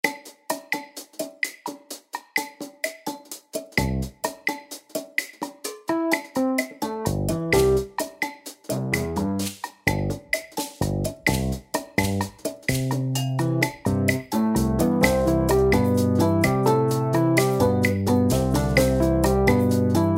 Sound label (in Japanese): ラテン サルサ風の曲